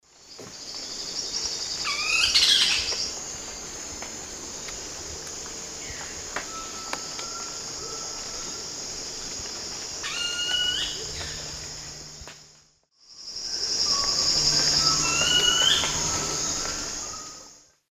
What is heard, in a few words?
Xiphorhynchus elegans, calls; S. America; Eastern Lowlands